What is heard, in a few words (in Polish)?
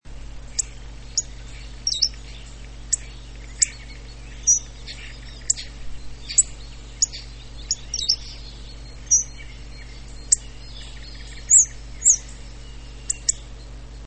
grubodziób - nawoływania, w tle inne ptaki